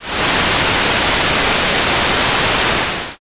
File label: rain